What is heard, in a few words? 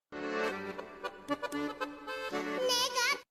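chinese kid singing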